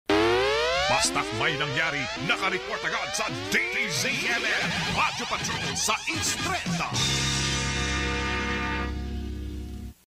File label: stinger